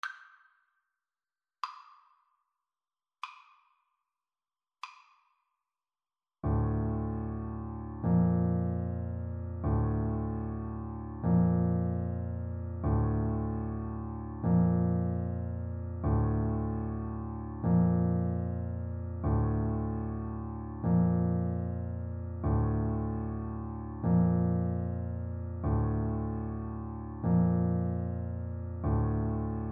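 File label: recorder karaoke; chopin funeral march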